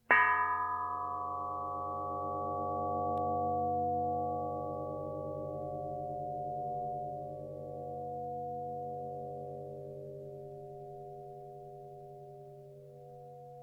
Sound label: Old Rusty Suspended Steel